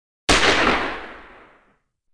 GUN, crime; zones

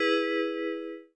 Default Beep

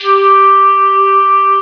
Chorused Piccolo (G4); Star Trek JR Audio

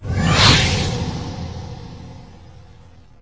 LAUNCH; Passion Pinball

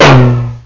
barrel, Hitting

